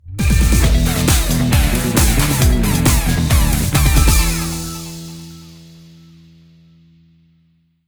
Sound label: You Win; Rival Wheels